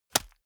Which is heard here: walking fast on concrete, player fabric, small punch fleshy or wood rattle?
small punch fleshy